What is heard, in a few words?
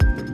Piano Kick, Drums; BB 2HTC Samples Vol 4